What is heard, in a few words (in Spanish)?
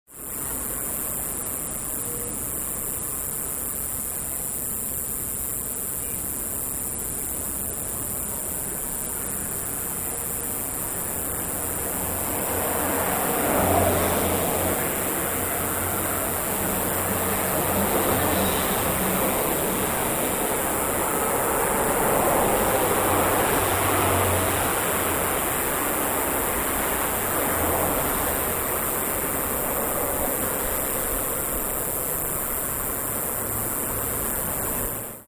calles; rivadavia 2400